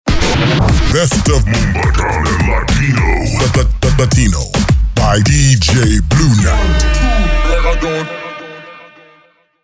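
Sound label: Moombahton Latino with Effect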